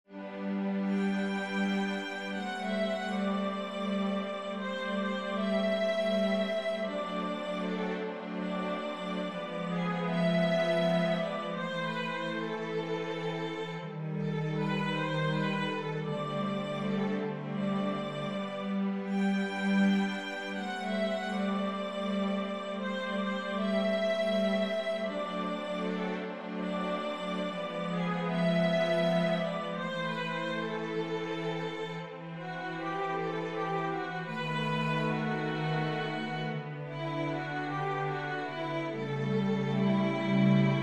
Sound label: nocturne full, violin